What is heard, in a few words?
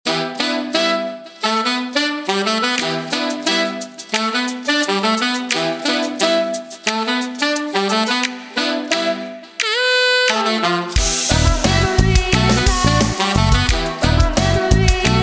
Jazz Track